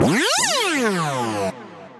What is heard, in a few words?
Ramp; Effects; Tenebrous Ramp